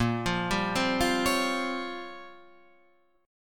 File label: A sharp Minor 6th Add 9th A sharp down, chord